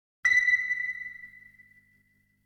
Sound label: Echoe